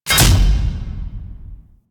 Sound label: Golden Maw attack impacts; Warframe; Ambience; The War Within